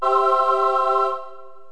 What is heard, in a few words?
Chorus; Multimedia & Business Audio Titles; GERMAN